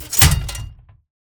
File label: Impacts; Just Impacts; Extension I; soundbits